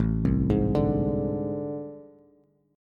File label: A Minor Major 11th A, Bass, Bass Standard; AmM11 arpeggiated; 5,3,6,7